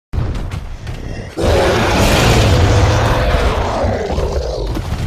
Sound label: roar rage; rajang